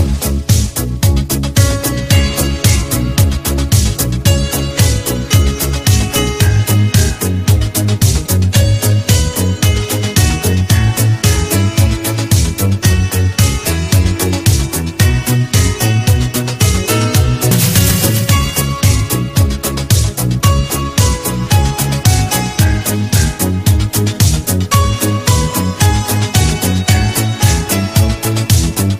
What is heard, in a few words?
Nice Disco Song